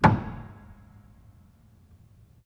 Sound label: Hamm Perc, Piano Hammer Perc; Piano Miscellaneous